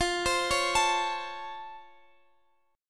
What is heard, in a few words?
C sharp Augmented Major 7th F, guitar chord